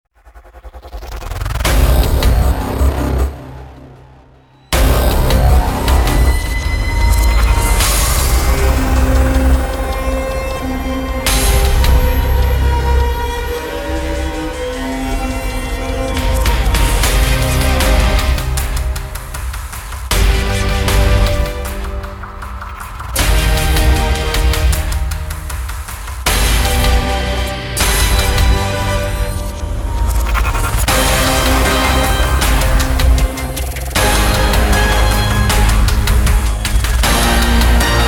Trailermusic

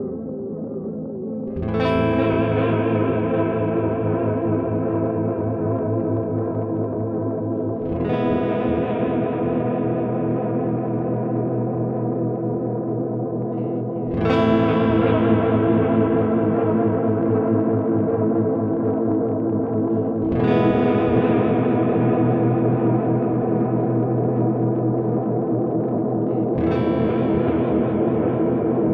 Starlight Echo Station Analog A

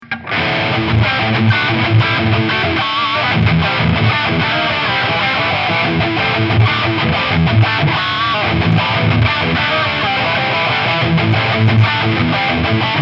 Overdrive Voice Blend

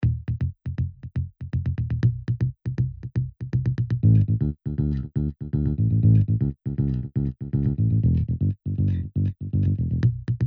Bass

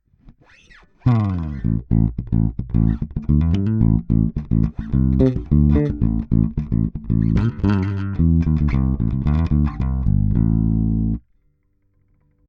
goldmike mk2 Bass

